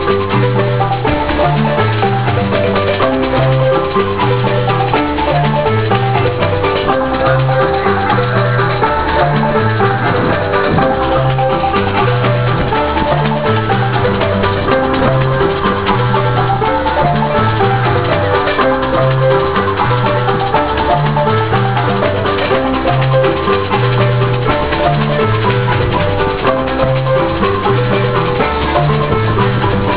street marimba musicians; Santa Cruz; California; Garden Mall

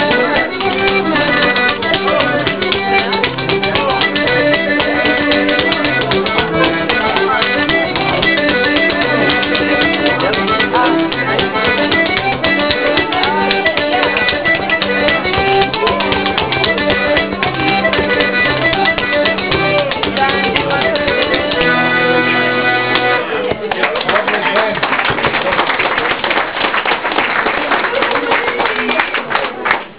pub musicians; Ireland; Connemara; Clifden

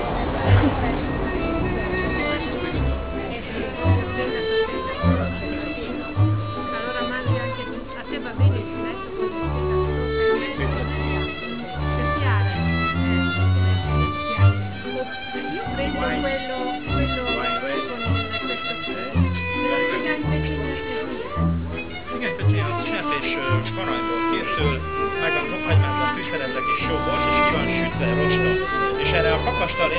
budapest violinist; Hungary; Men; Europe; People

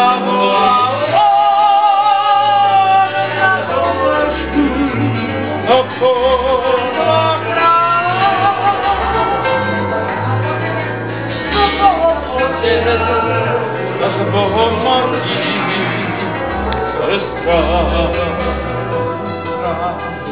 violin player singing, Slovakia, Gypsy Music; Europe

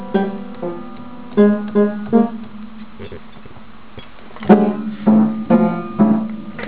playing piano

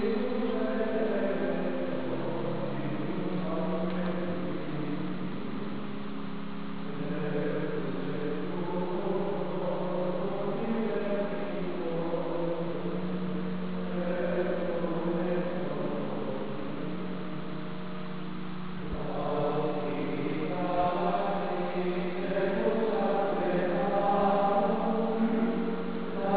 gregorian chant; Italy; Tuscany; Monestaries; MonteOlivetoMaggiore

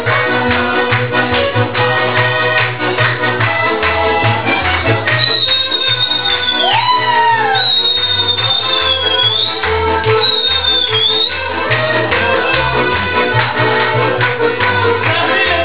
accordion player n musicians; Slovakia; Gypsy Music; Europe

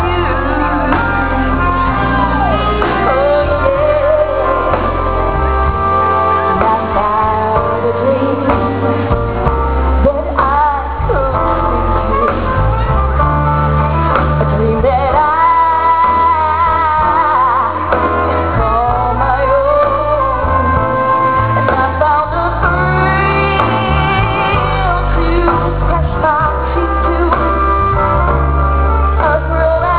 band; California; Marin; ClosingNite

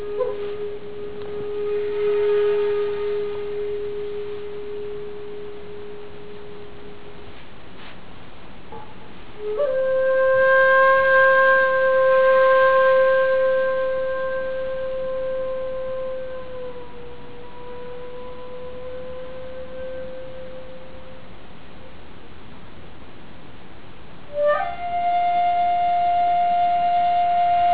flute player, Japan; People; Asia

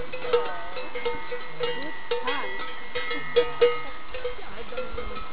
dolomite cows; Animals; Europe; Italy; Dolomites

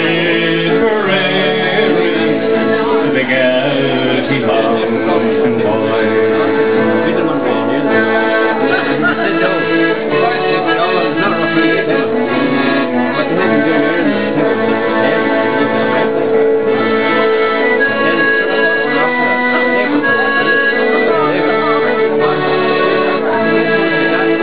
pub musicians; Ireland; Connemara; Clifden

